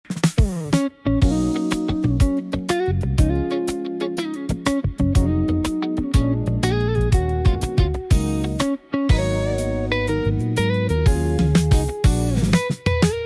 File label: Happy Birthday Funk Guitar, instrumental Version